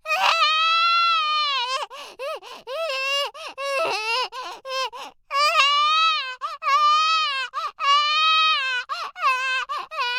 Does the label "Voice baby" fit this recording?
Yes